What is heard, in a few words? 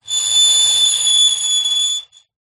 plastic small item scrape screech metal; zapsplat